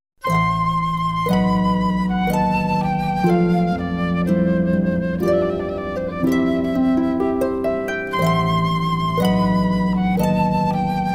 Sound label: Wedding March